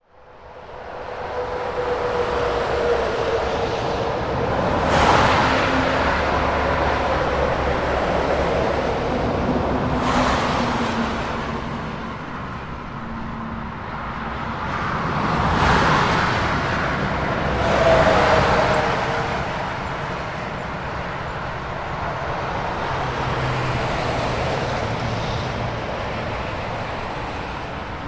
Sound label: autobahn bridge right side